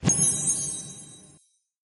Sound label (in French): sorcier spawn